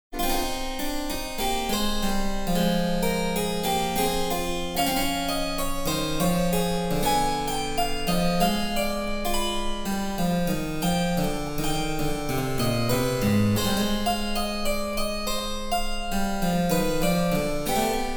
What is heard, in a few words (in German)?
Cembalo; Mark Lindley WTC 1